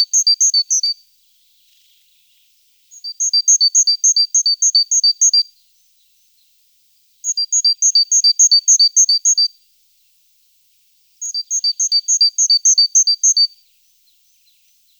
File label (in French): mesange charboniere; TOULON; PRESQUILE